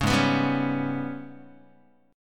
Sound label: G sharp Minor Major 7th Double Flat 5th, Guitar; strummed; JGuitar